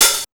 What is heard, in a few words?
HH OP, HH OPEN; AKAI; USB Soundscan vol.20 - Fresh Disco House I